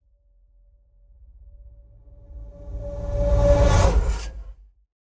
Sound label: Just Transitions Creepy; soundbits